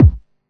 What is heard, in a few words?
Kick, retro house samples, Drum Hits